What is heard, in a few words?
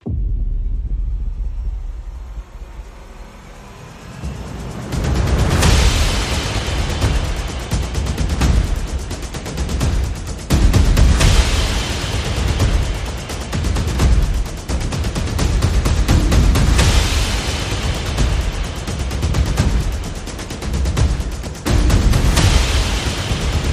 Percussion and Effects